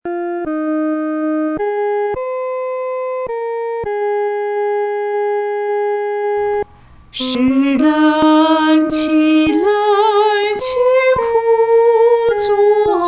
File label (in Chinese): 独唱 第一声; 岂能让主独背十架